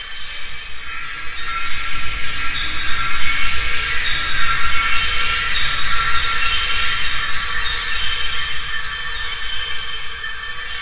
Loop Echo; KriptoFX; Realistic Effects Pack v1

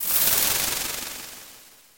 Explosion Shock; KriptoFX; Realistic Effects Pack v1

